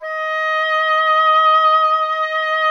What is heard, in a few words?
oboe D; Miroslav Vitous; Solo Instruments VOL-2